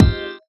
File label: Stab Melodized Fmin7